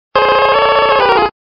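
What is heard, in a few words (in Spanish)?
Grito, Pokémon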